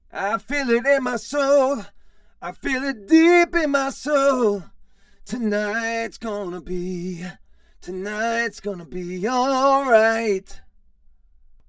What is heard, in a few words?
Chorus Vocals Warmness; CRTIV Chorus